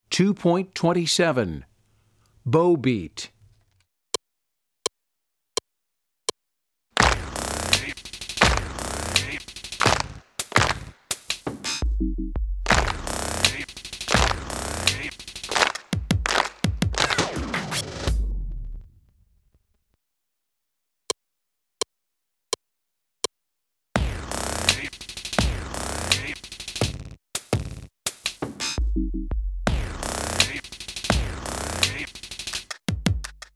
Bow Beat

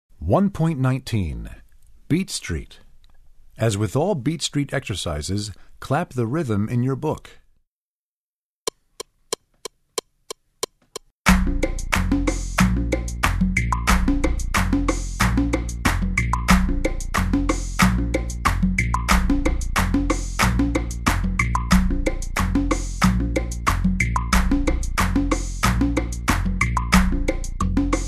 Beat Street, Percussion; Percussion Book 1

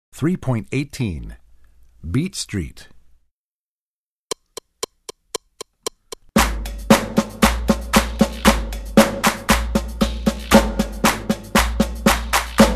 Beat Street, Percussion; Percussion Book 1

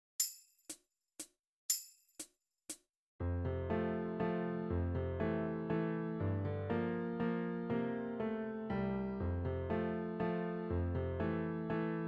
Harmonica

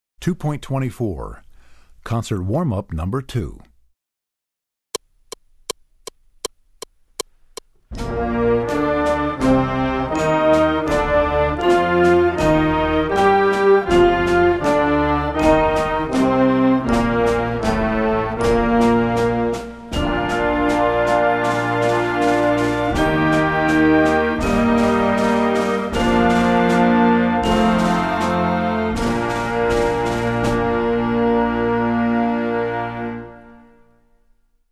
Concert Warm-Up

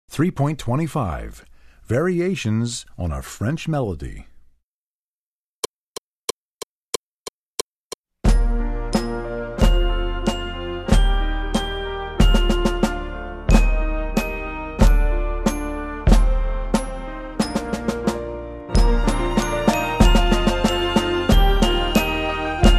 Percussion; Percussion Book 1

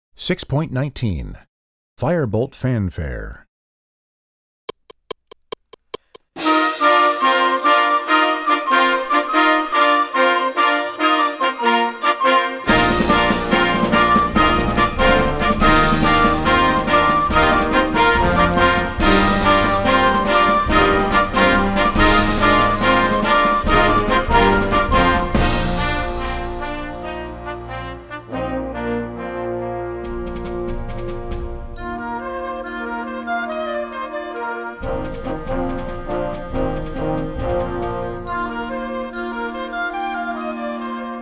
Firebolt Fanfare

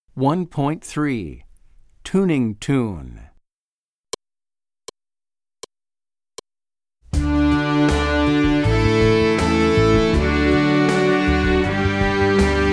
Tuning Tune

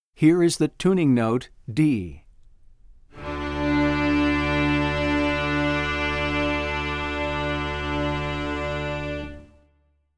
Tuning Notes; Play-Along Tracks for Opus 1

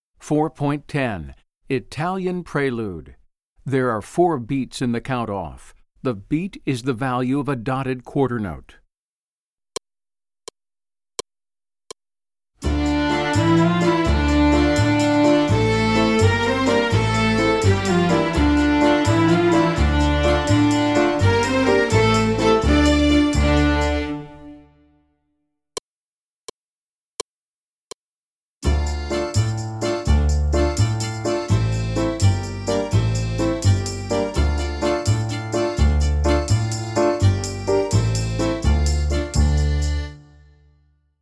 Italian Prelude

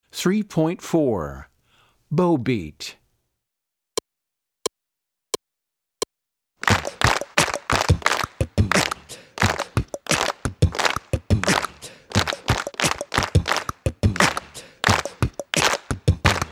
Bow Beat

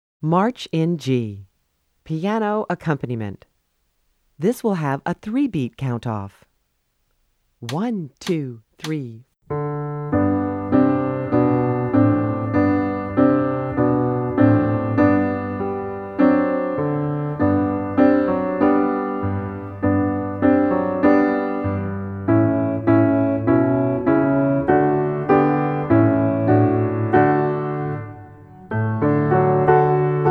March In G Piano Accompaniment; New Directions for Strings Book 1

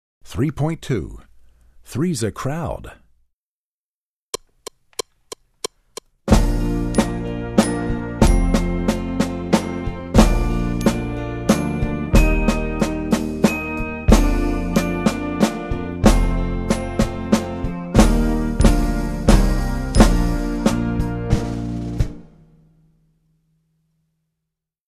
Percussion; Percussion Book 1

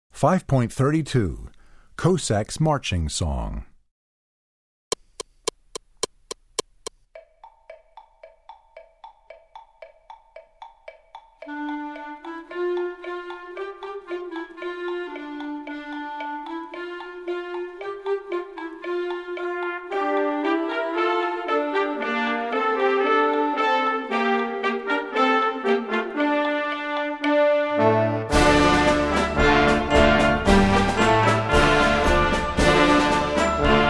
Marching Song; Cossacks Marching Song

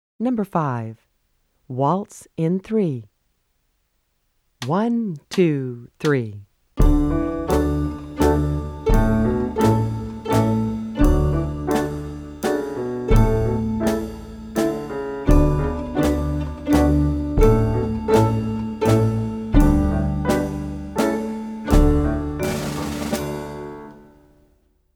Waltz In 3

